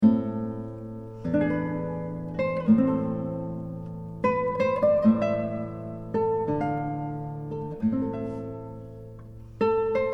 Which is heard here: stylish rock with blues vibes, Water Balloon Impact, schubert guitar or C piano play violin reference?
schubert guitar